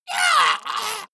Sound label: monster hit high; schreie